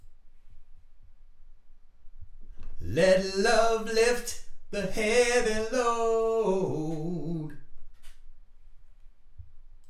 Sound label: vocals